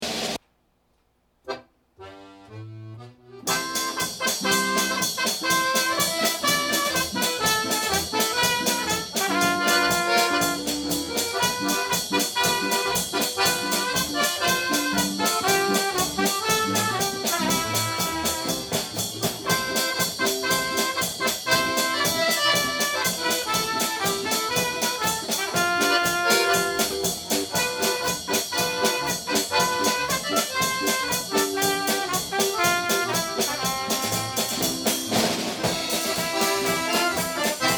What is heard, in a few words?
Tic Toc Polka, brass